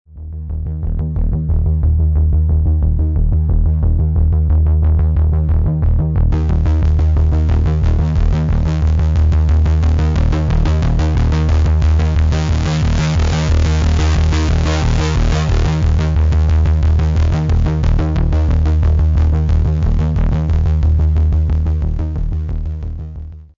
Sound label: olga filter